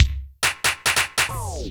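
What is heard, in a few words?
DRUMLOOP ELECTRO 140; Techno2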